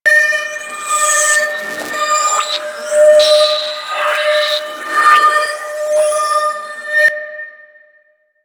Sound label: Defend Song Loop; Enemies; Warframe; Vomvalyst; Teralyst